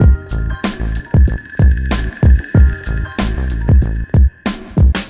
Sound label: Hiphop, ringtones; Google; NewWave Labs